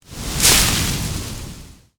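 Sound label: poison seeds pop in spawn sound; posionseeds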